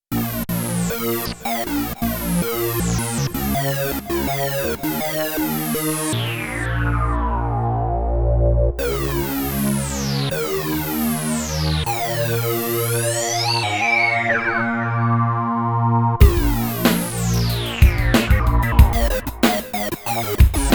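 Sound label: Vintage Sync Bass, Korg Radias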